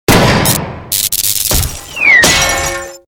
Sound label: zap stagelight miss